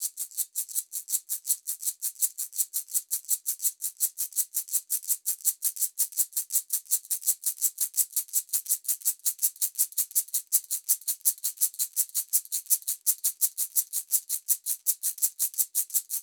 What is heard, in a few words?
Wood shaker; Crow Hop (Weemmarategwa)